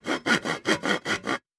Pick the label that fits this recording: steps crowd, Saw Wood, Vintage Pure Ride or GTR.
Saw Wood